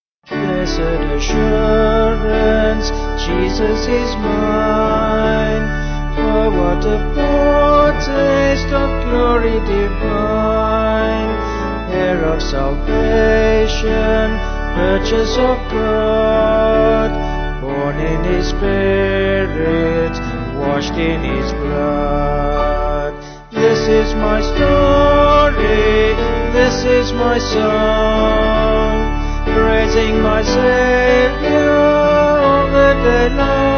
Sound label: Blessed Assurance Vocal Organ Piano